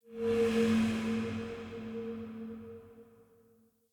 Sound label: Coin Toss Transition Out; Level Events; Midrath